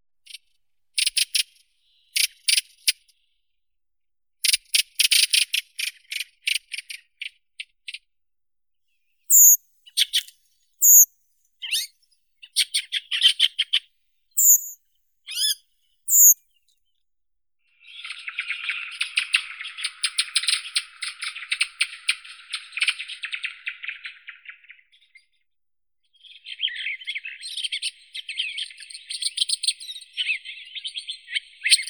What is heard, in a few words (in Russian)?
drozd belobrovik turdus iliacus